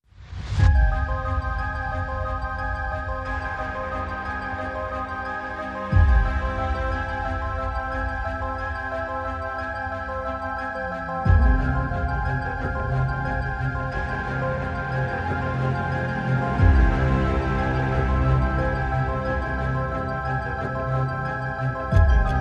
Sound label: No Choir